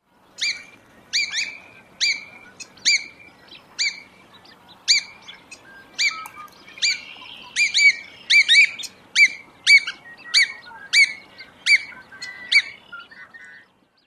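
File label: calls, Hudsonian godwit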